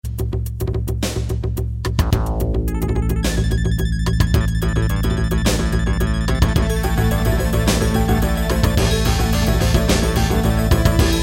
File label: Character Selection Screen; Golden Axe; TurboGrafx-16